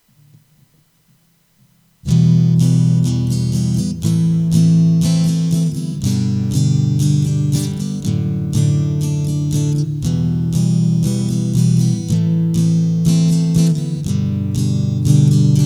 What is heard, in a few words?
main riff